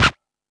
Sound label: House Machine, Drum Machines